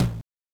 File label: Tom, Genesis